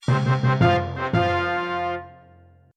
achievement; El Chavo: Una Feria en la Vecindad

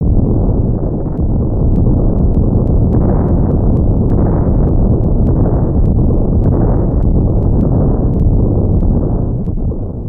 Explosion; snes

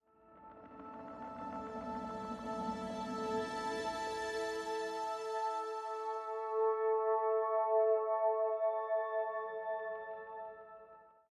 85bpm; Imperium High; Legends of Pegasus; windows gamerip 2012

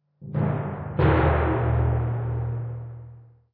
Tymp Hits

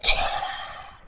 Through

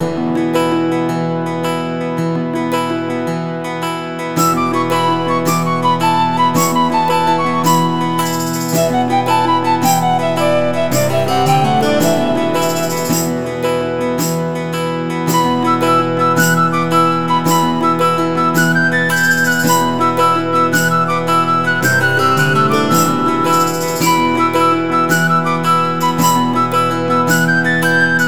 Medieval Thoughts - Loop; atmasphere windows gamerip 2018

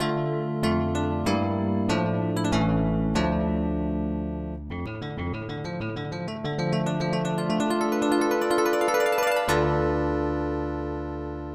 Haunted Piano; Demon of Laplace; SNES

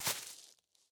Step Grass; miside demo windows gamerip 2023

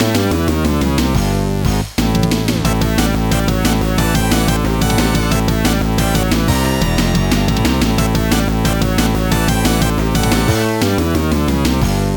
Jingle Invincibility; Laps 4 From Virtua Racing Deluxe; Metal Sonic Rebooted; genesis

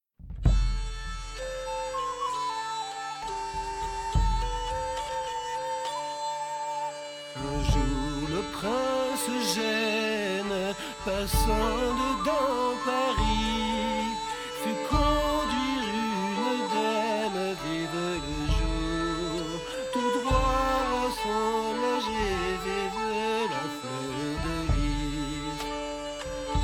Tavern; assassin s creed unity gamerip 2014

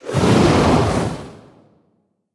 Conflagration; Hero Beresad

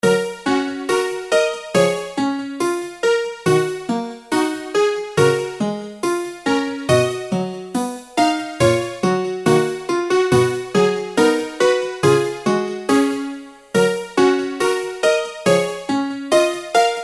BGM GALLERY; Kurupoto Cool Cool Stars

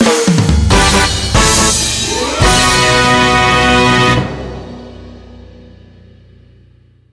fanfare; otome the exorcist

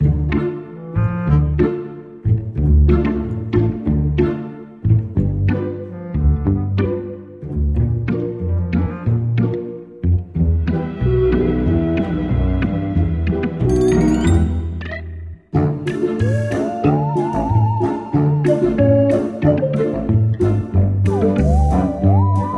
Music Game; pudding monsters

